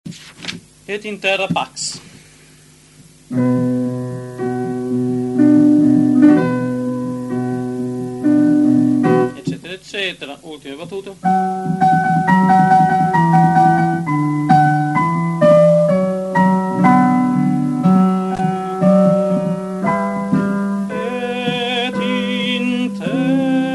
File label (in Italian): Et in terra pax Tenori; Vivaldi; Gloria